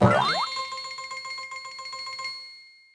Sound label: Scrolling Barrel